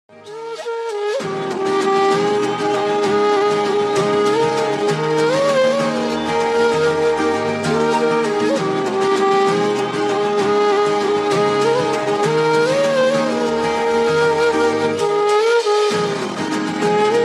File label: Instrumental; Love Insurance Kompany